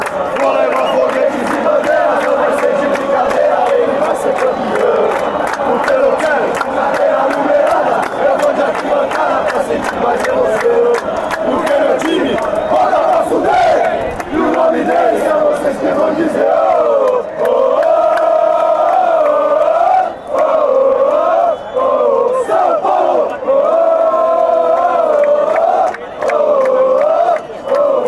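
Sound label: sao paulo chant, football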